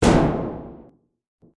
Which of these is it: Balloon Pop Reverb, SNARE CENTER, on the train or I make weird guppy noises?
Balloon Pop Reverb